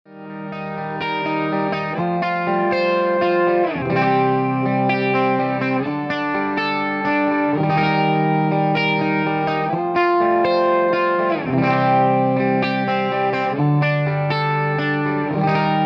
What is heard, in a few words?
CLEAN, guitar